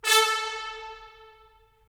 BRS, Tps Stacc; Roland - LA Composer vol. 4

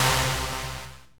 RAVE, RAVESYN

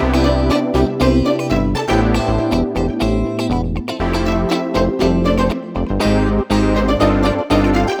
Backing, 120bpm; Zero-G; Nu Disco; Nicengroovey C

